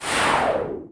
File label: Lamp Feeble